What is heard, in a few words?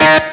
staccato; osu